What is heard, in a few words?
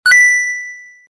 Coin Collect; Berry-Dash